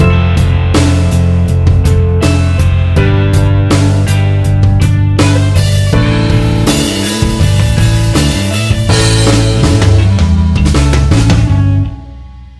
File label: VIsus4